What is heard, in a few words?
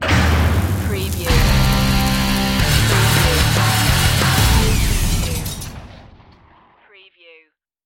rock intro